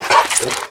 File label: dog pant